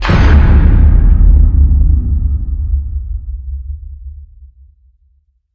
Hit Low; Hit Low Stroke Of Death

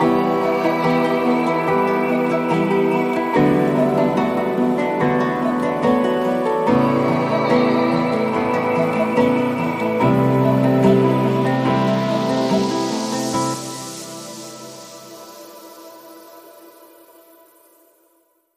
Sitar And Tea, Link Sting

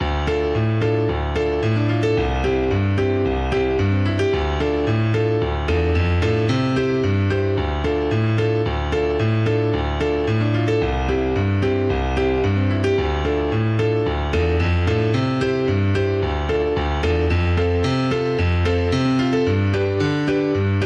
Piano

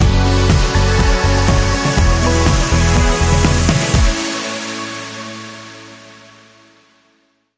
Bumper

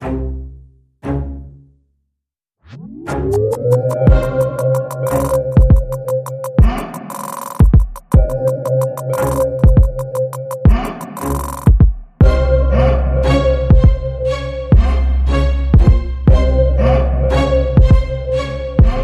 Trap

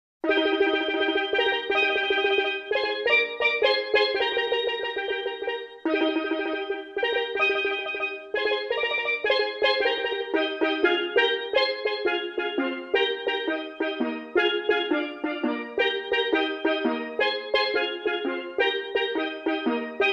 Strolling Through The Market, Steel Drum Only

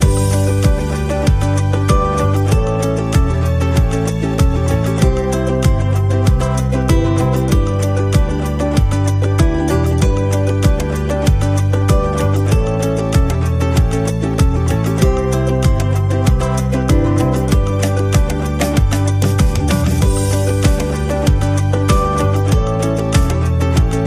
Ukulele

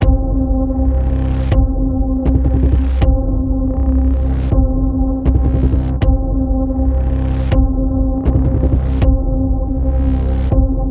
Ominous Chase Panning Pulse C, Key of C

